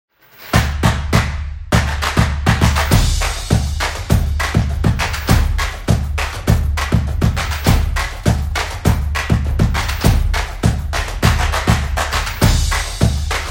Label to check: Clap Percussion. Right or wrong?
Right